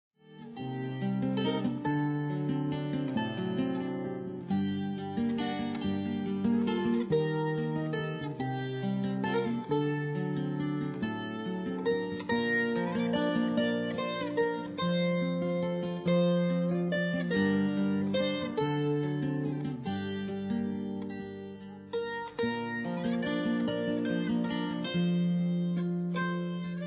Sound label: instrumental